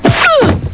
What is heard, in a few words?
bip